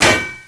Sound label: fuel tank large, destroy